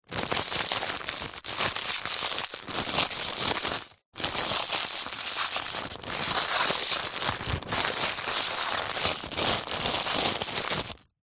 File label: foley plastic stiff packet small handling roughly; zapsplat